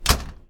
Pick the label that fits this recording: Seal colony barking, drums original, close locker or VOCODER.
close locker